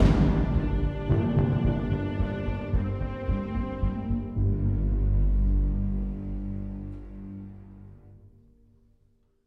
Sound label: String; Assassin's Creed Unity Dead Kings